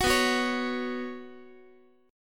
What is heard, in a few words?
B Suspended 2nd, Guitar; Bsus2 strummed; x,x,9,9,10,12; 4A,4E,5A,5D,5G#,6C#; JGuitar